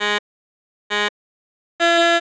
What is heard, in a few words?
pipipi; deathrun; jigsaw